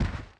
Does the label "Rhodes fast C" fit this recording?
No